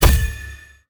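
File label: euphonium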